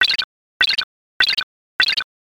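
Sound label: footsteps bush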